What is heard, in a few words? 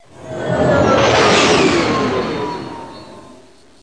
x wing fighter